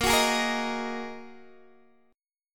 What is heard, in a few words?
Bb 7th Suspended 4th Sharp 5th Bb Guitar Open D; x,13,16,14,13,13